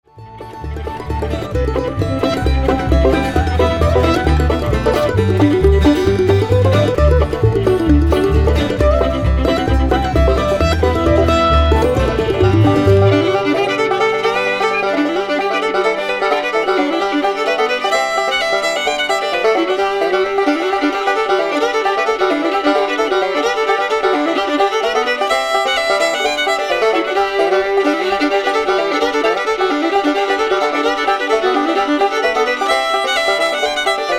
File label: bluegrass band; falling run; big sciota; Zone 8 Recording